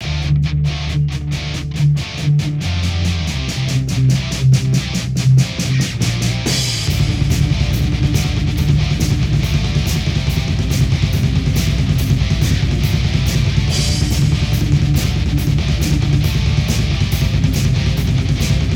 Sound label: Beat